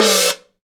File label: HuYinLuo Lo Mute; Cymbals